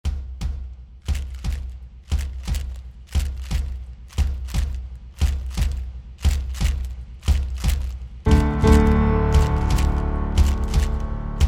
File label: drum spirit